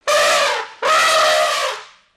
elephant

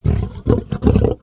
Pets Lion Happy; creatures; Lego

